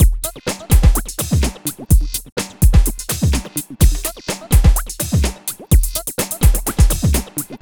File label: Beat, Drums & Percussion; Electrocution; Beats